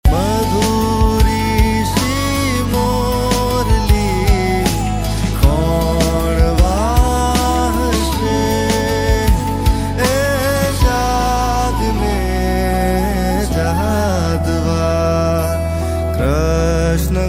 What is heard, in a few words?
gujarati ringtone